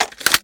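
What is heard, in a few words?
sniper reload empty fast pull bolt; gmod; iw8; xmike109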